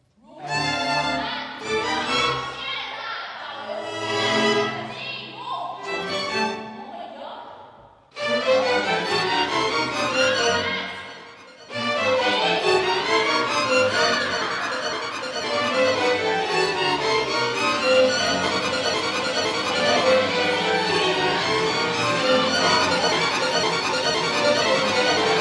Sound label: disco peoples music